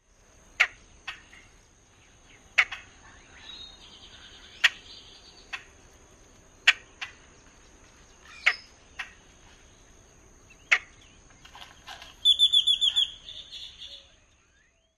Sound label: chuck calls; TX